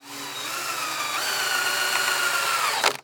Power Drill Run Stop; Tools Construction; Soundopolis; Fienup

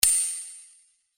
Sword Sound; FXHome; Fighting Sounds

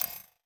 Interface Button Digital; MatiasMacSD; PRESS - INTERFACE SOUNDS

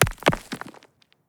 Rocks Impact Hit Single Stone; Rocky Impacts

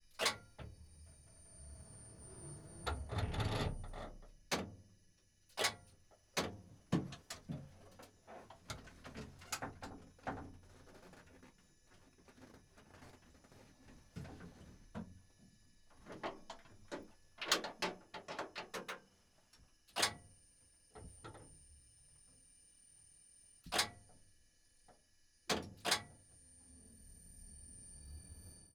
Stridsfordon 90 - Fixing Turret; Combat Vehicle 90; Pole Position Production

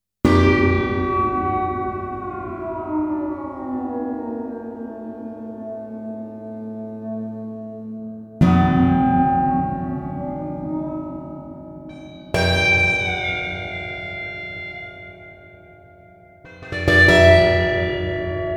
Orchestral Phrase; FXHome

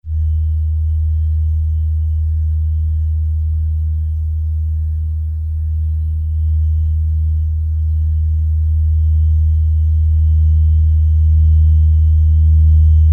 generator; FXHome

